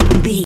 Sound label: footstep metal land